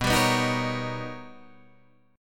B Augmented Major 9th B Guitar Open D

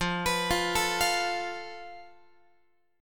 F Major Flat 5th, Guitar; FMb5; x,13,14,15,13,16; 4A,4E,5A,5D,5G#,6C#; arpeggiated

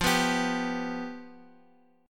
F Suspended 2nd Flat 5th, F, Guitar; Fsus2b5 strummed; 4A,4E,5A,5D,5G#,6C#; x,x,x,3,3,6

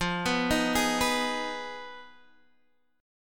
F Minor 7th Flat 5th, Guitar, DADGAD

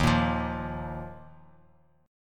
Eb Suspended 2nd, Bass Standard; strummed; chord; x,6,8,10; JGuitar

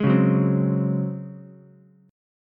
C sharp Major 7th Suspended 4th C sharp Ukulele; strummed; chord; 1,1,2,3; JGuitar

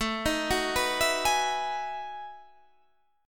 B 13th A, chord

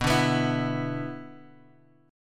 B-Minor Double Flat 5th; Bmbb5 Chord {X 2 0 X 0 0} strummed

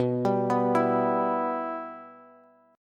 B Suspended 4th Sharp 5th, Bass; Bsus4#5 arpeggiated; 19,22,21,21; JGuitar

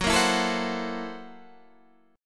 F sharp 7th Sharp 9th Flat 5th F sharp

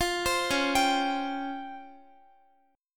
Db Major Sharp 11th Db down Guitar; DbM#11; arpeggiated; tuning=4F,4A,5C,5G,5C,6E

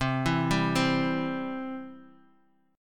C Major, Guitar Open D

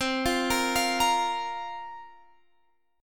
C 7th Suspended 4th, Guitar, Half Step Down

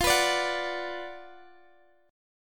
F sharp Major 7th Suspended 4th Sharp 5th

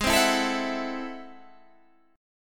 A Minor Major 13th Ab

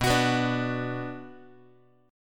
B Minor B Guitar; strummed; JGuitar

